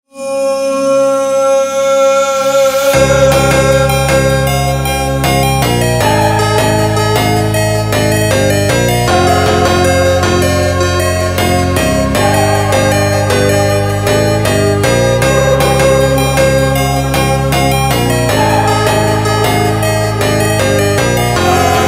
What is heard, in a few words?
slowed phonk breakcore